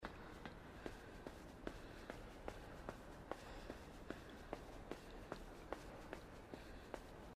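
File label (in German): hall schritte; inside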